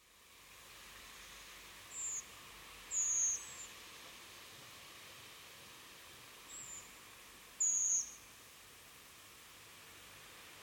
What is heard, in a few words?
redwing NFC sequence